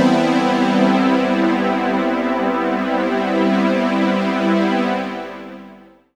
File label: Gravitational Pad; Jungle; Pads; Blu Mar Ten; Jungle - 1989 to 1999